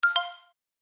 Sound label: Musical Game Elements, Video Game